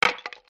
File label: broken; indie cannon